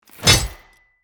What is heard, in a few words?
Shield impact clang hit